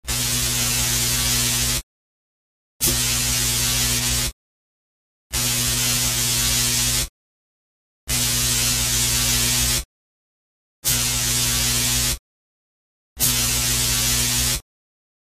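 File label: laser welding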